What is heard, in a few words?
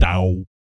BASS FX; ILIO; Vocal Planet VOL-3; Jazz & FX